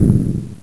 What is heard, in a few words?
medium explosion; Powermanga